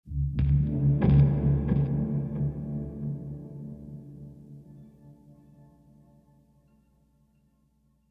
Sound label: Lap Steel Rhythmic; ML Lap Steel Rhythmic Mood 12